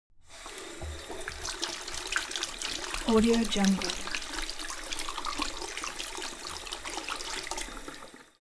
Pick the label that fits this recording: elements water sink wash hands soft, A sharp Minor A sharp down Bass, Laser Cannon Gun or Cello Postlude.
elements water sink wash hands soft